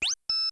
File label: reserve item store; smw